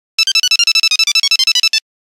Nokia Buzzer; Windows Phone apps; 1100 Spiral

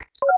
scrollable enter; Samsung Galaxy-S8; STalkback

